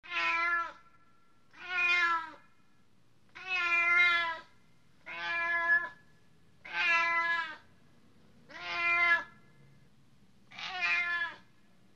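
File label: koshka golos, cat voice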